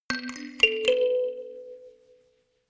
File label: notification kalimba rattle sequence ascend